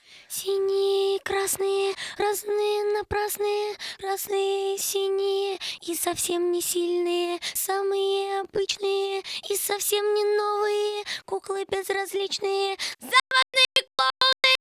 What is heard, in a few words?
cuplet